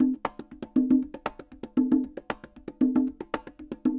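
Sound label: CONGAS SALSA, salsa drums and percussion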